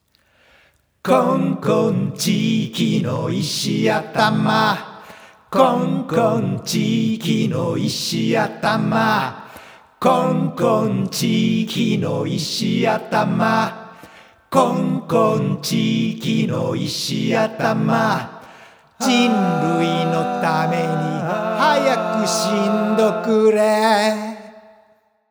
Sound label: vocal chorus; masaakiwada